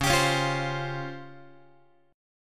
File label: Db Major 7th Flat 5th, Db, Guitar; strummed